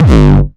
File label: 909 Grime Layer 2 - G1, Drums, Kicks; Mbase 11